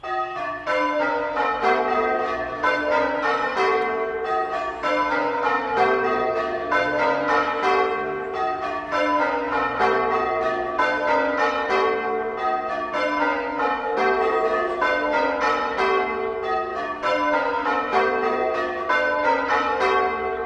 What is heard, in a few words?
bells; cccbr; chalford